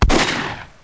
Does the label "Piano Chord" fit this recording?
No